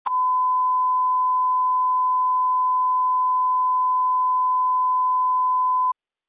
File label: alert 2 tone